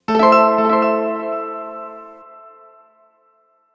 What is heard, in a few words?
Chord Delay Amin